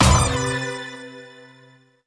UI Quest; Inotia 3

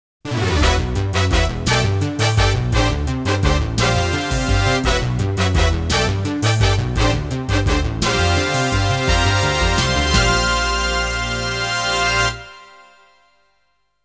Battle Cry of Victory; Sangokushi III

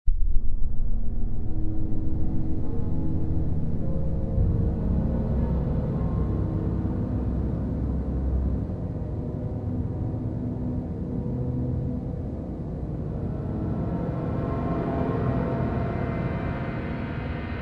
Mood Music; F.E.A.R. Extraction Point

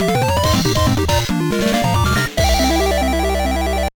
Duel Win; Yu-Gi-Oh! The Eternal Duelist Soul; GBA

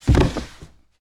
Bodyfall Wood

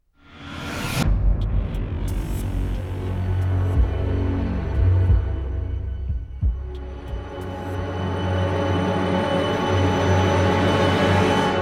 Success; SideStory; Orphan Caves; Riddler Success

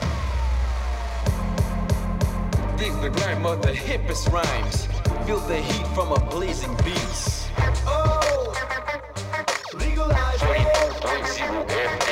Radio Hiphop Jingle; Gangstar Miami Vindication; android; macos

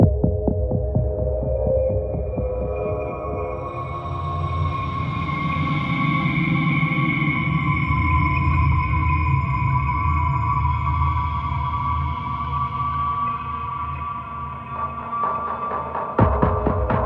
Sneak

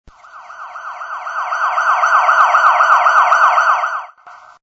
siren; Flying Metamorphosis Queen of SM Bar Fuate Co; android

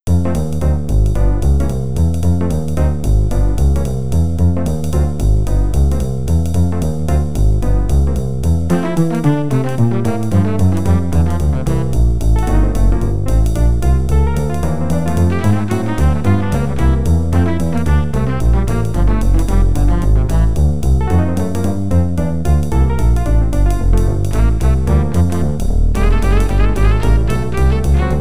Hold That Train IBM-PC MT-32; Transport Tycoon

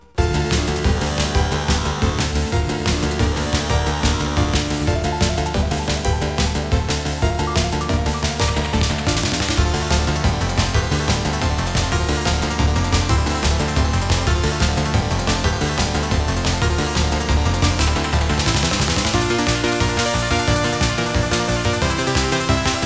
FIRE WARNING; Space Manbow

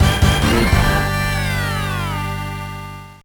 Jingle Game Over

